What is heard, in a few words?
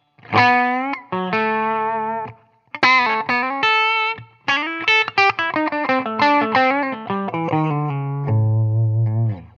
bypass lick; Rambler 25W; in an casual manner with a Shure Unidyne 545 microphone; blue lick; Lehnert